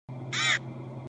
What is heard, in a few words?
hit sound; undertale; floweys